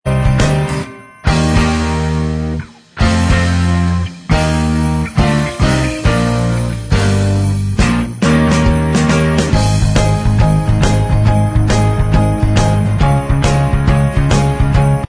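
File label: instrumental, backing tracks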